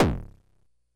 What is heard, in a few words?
kick, Drum Machines, Korg DS-10